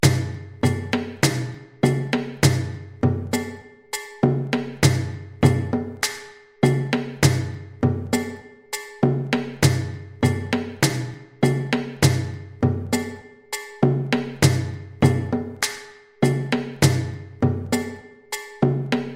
Kpatsa Groove 100 bpm, djembe

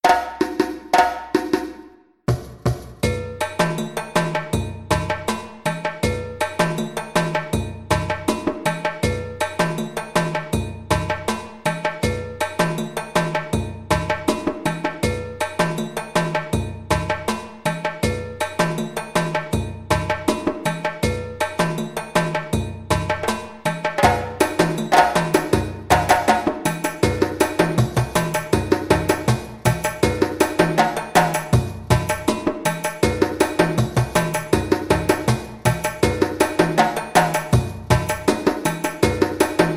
Fanga Groove 160 bpm, djembe

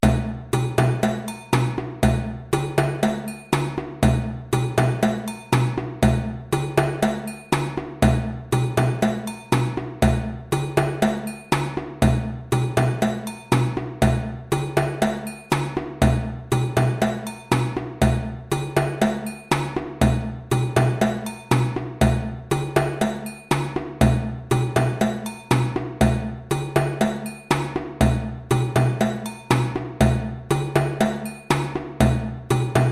Soboninko Groove 120 bpm, djembe

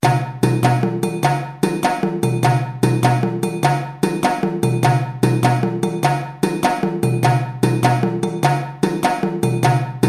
Kadan Groove 150 bpm, djembe

